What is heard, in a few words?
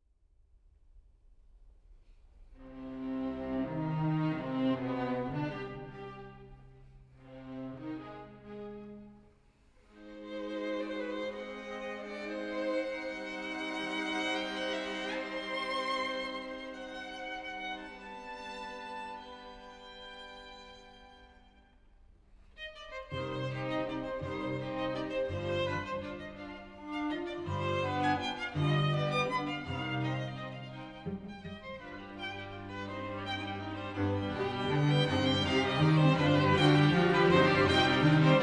String Quintet in C Minor, Allegro con brio; Op. 104; Ludwig van Beethoven; Beethoven - String Quintets Op. 29 & 104